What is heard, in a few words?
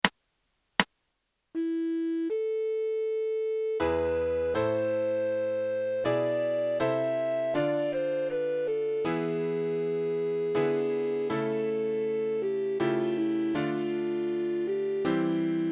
White Melody Piano